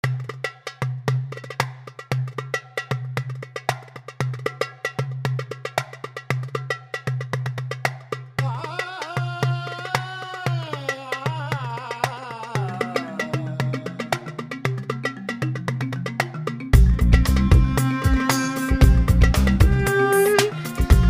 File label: World Pop